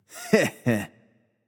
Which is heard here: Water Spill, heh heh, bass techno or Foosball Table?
heh heh